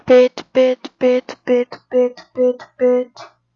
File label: beat